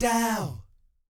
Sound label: "DOW" A#3, vocal jazz fx; Spectrasonics; Vocal Planet Vol.3 Jazz Fx